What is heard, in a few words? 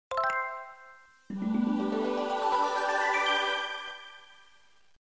sega naomi startup